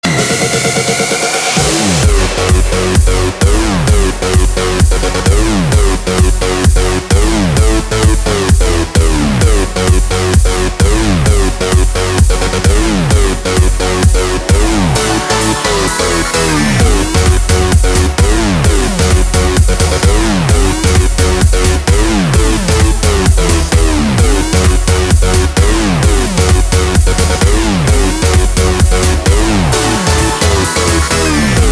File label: Electro